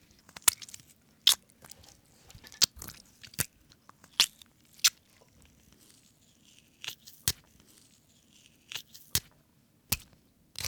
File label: Stabbing And Tearing Flesh